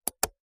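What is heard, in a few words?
computer mouse click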